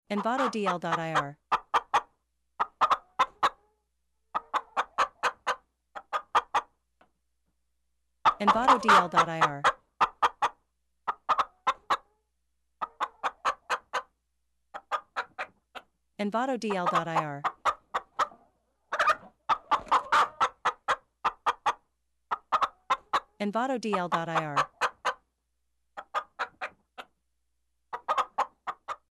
Chicken Clucks; قدقد کردن مرغ; nature sounds

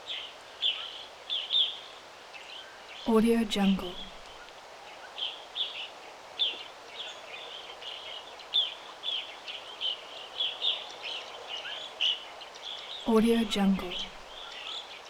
birds forest birds sparrows forest; گنجشک در جنگل

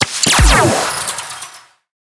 game weapons designed special blaster beam star; futuristic sounds

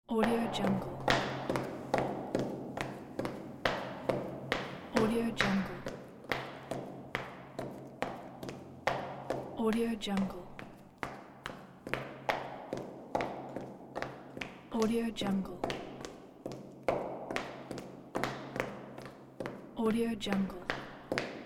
running in heels in hallway; urban sounds

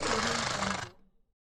alien evil creature snarl; game

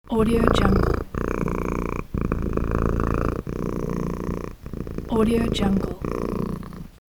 cat purring

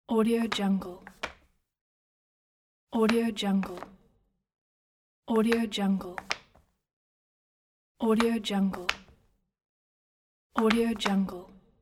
flip flops sliding on off; domestic sounds

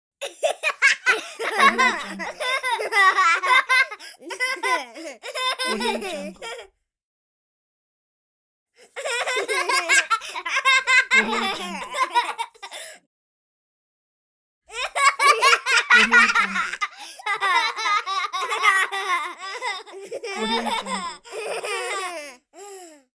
two children laughing; دو کودک در حال خندیدن; human sounds

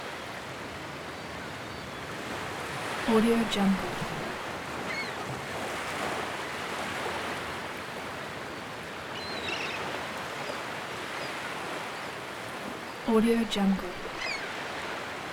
cooking game ambience beach; domestic sounds

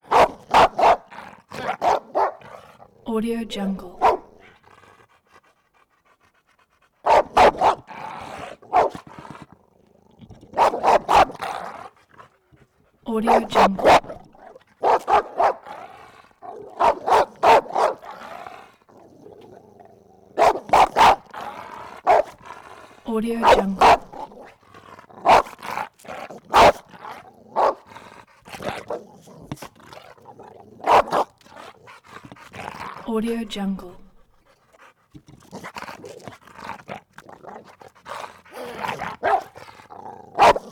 boxer dog angry provoked barking snarls; سگ باکس; nature sounds; صدای پارس کردن سگ باکس

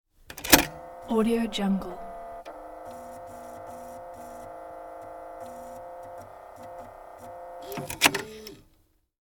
floppy disk inserted read and ejected; industrial sounds

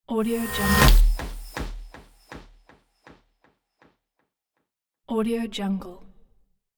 transition sweep hits delayed; transitions and movement

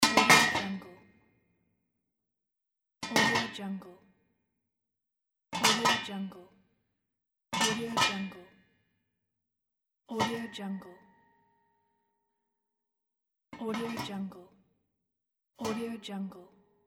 empty cooking pot metal lid; domestic sounds

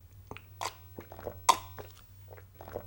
foley drink drink gulp sip swallow fast; هورت کشیدن و قورت دادن; human sounds

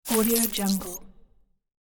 power off alien glitch; futuristic

